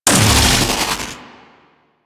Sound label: Electric Zap Large; Ambience; Props; Warframe; Corpus Railjack